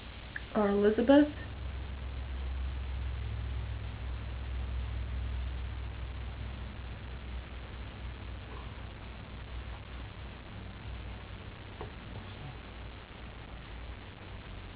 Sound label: Amys room breath, EVP; Homer Mill 2009; Homer Mill EVP 1